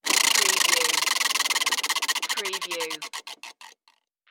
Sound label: Toy Spin Stop